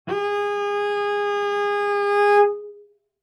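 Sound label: Cello arco fortissimo sul D Ab4; MIS Pitches - 2014; Strings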